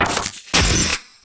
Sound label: emp idle; zaero; items; empnuke